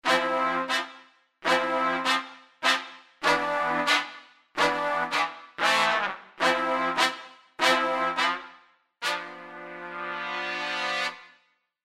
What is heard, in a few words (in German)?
horns trompete; chris hein